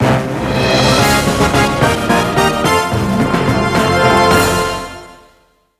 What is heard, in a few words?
Result Win; Microgames Win; tetris 99 2019 switch gamerip